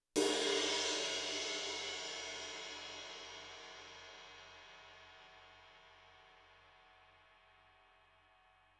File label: 20 RIDE CRASH, cymbals